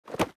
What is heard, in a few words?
mob grab